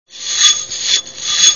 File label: Slinky restore up; Desktop Studio 2000